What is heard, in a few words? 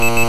Picking up Pod